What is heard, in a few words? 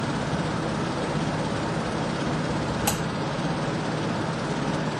Inside Tattoo Shop